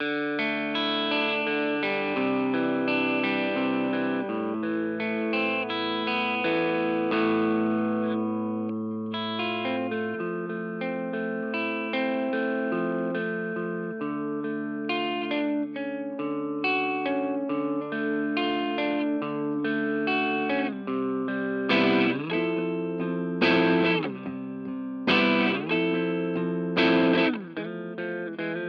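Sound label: Hagstrom Crunch Both, guitar; Pat Smear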